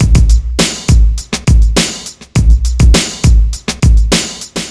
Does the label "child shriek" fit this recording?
No